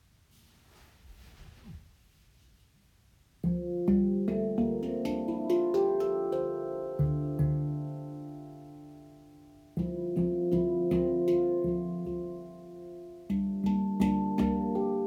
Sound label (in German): Handpan Hörbeispiel